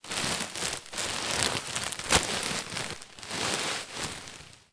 office packing peanuts in large plastic bag movement; zapsplat